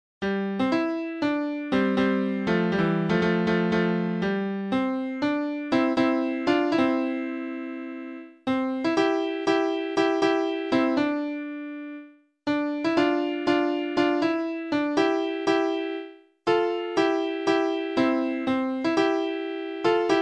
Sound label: piano